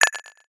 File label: blip